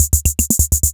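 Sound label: Kay R8 bass drum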